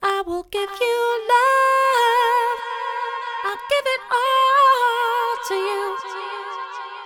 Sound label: Vocals; sidechain compression in Ableton Live